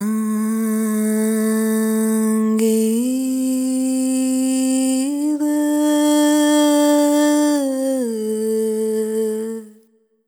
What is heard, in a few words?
ETHNO FEMALE, Vocals; ethno2